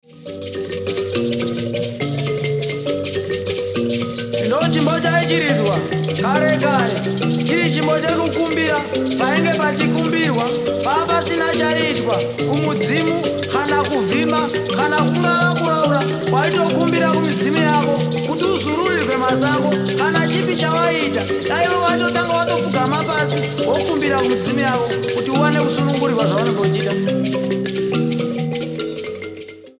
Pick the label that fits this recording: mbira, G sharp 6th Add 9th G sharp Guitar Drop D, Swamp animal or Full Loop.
mbira